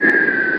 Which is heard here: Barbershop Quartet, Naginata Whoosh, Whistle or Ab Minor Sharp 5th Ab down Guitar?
Whistle